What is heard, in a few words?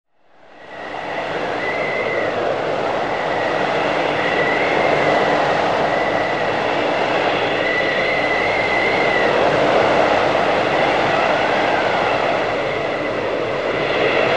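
blizzard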